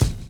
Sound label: DRUM CHOP; AMG Gota Yashiki Groove Activator